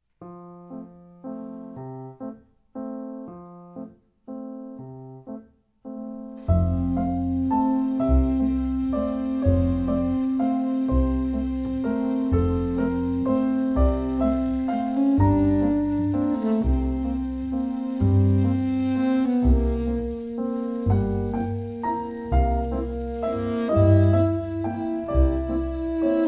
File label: Rain Waltz; Napple Tale: Arsia in Daydream